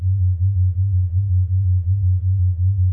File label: loop bounce pad louder